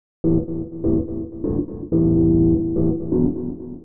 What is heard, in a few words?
DEEPHOUSE ORGAN 125 A